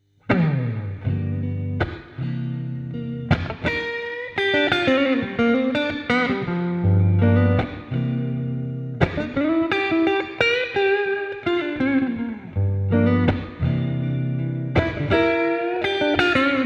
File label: X SPACE SPRING; xgear